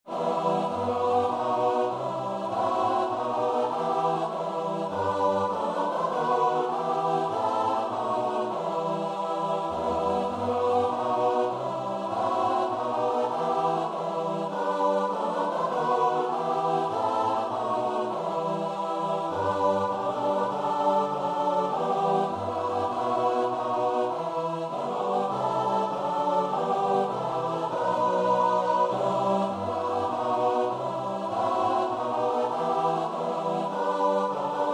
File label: choir